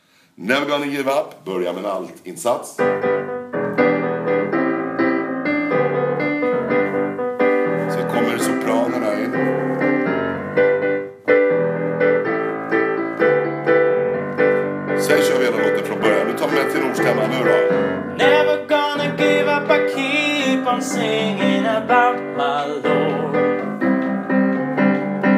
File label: Tenor; Gospel